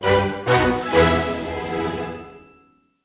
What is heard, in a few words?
Win fare; Swampy